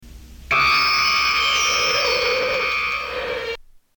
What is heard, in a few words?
Bionic eye sound